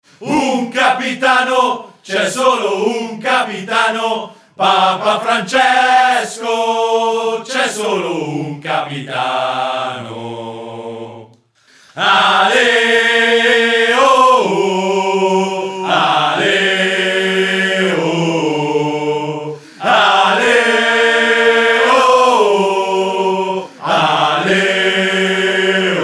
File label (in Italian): Coro di saluto ufficiale